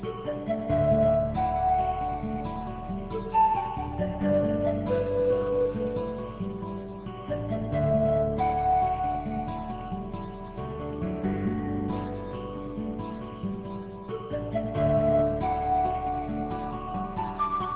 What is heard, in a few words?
flute Hold Music